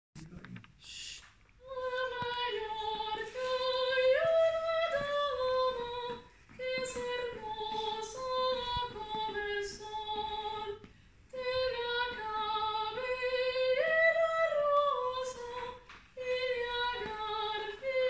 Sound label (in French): soprane; la dama Mallorca